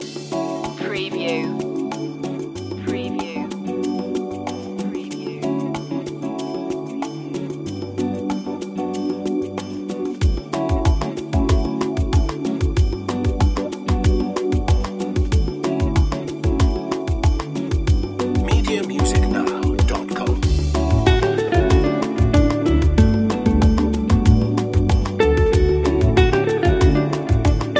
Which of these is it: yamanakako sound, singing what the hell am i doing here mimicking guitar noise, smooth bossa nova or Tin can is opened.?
smooth bossa nova